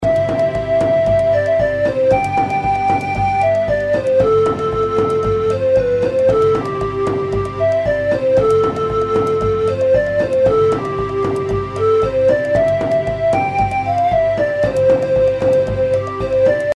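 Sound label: intro background music; Dreamcatcher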